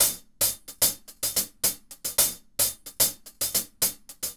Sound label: Hi-Hat Groove